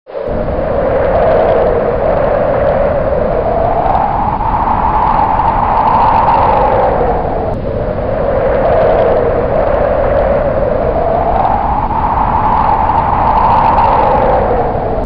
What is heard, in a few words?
wind in desert; زوزه باد در بیابان